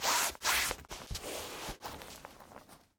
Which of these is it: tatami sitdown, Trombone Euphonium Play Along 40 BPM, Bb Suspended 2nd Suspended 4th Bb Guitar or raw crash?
tatami sitdown